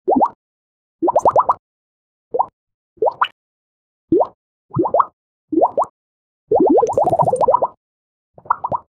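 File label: playful pop sounds whoosh, bubbles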